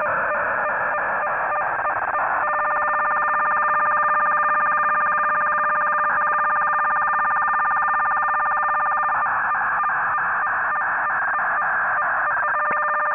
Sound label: AKKORD